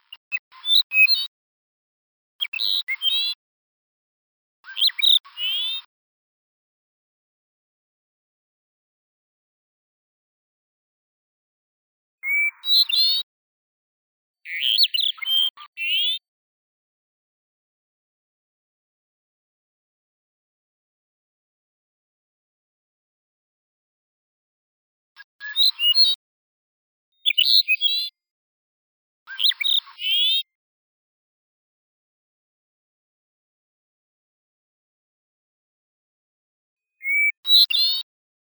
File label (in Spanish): canto, especies amenazadas; conservacion de especies amenazadas; biodiversidad